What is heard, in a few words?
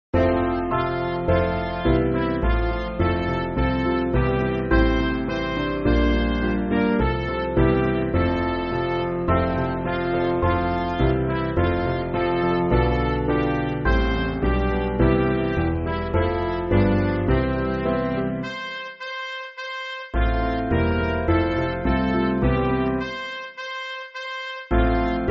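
Midi, Music Backing Files for Churches; Hark The Herald Angels Sing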